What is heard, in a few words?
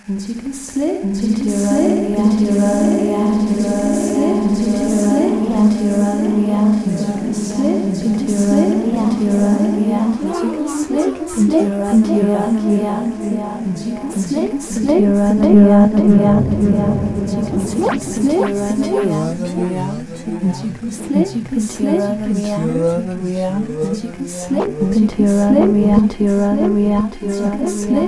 delay tweakin